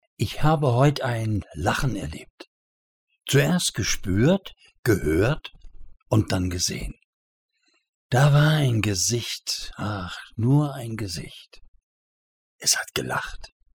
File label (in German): Lachen; Ein Lachen erlebt